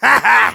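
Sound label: Demoman laugh short, German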